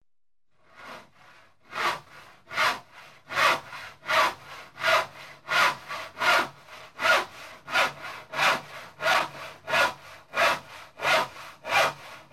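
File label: hand saw sawing wood long slowly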